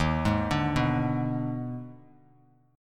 D sharp Major 7th Suspended 4th Sharp 5th D sharp Bass Standard, chord; arpeggiated; JGuitar